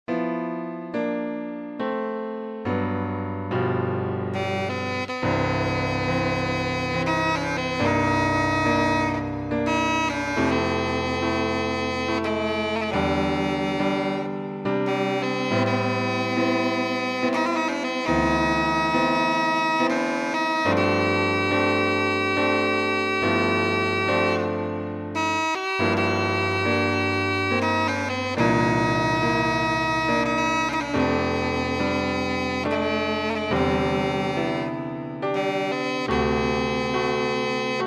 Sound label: Amazing Brass Sax